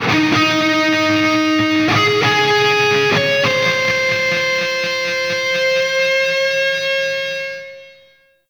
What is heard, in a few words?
Stadium Sound Check Guitars; Warframe; Ambience; Nineteen Ninety Nine